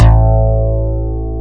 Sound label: DIGI BASS; DIGI BS